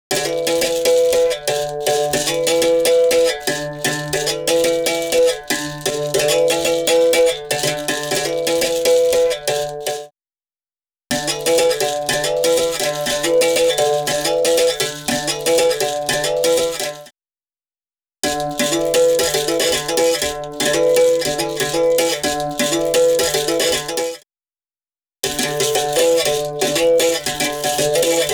Didgeridoo And Overtone; Good Stuff Audio

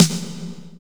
rev snare; Greytsounds VOL-1; PROCESSDRUMS

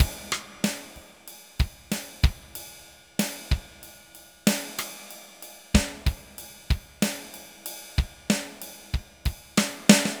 Acoustic Flow, Processed Percussion

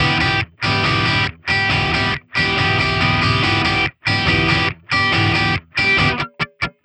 Distorted Guitar 140BPM E; Vengeance - Essential Clubsounds Vol.4

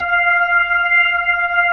B-3 Jazz F6; Keyboards of The 60's and 70's

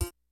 HITS BITS; Kid Nepro - Elite

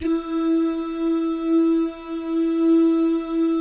DOO, jazz FX, mix guy gal; Spectrasonics; Vocal Planet

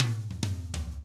FUNKY Drums; ILIO; Double Platinum Drums 1; 99 FUNKY 2

